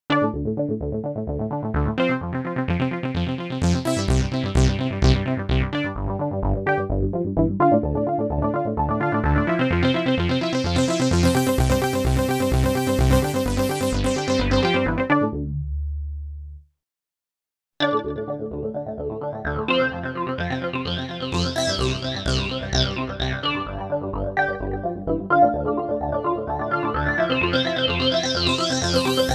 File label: Synth